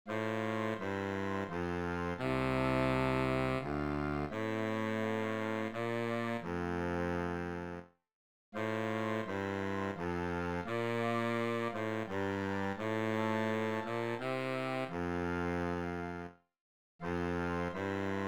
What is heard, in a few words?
tuba Eb